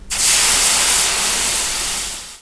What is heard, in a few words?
steam blast